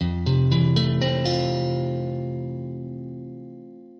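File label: F maj, Guitar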